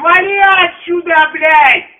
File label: door saw; svencoop